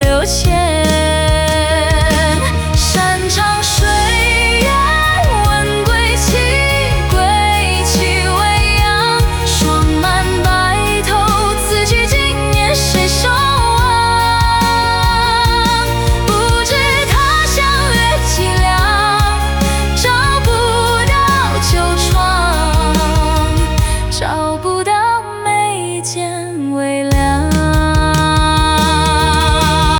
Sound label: hook mixed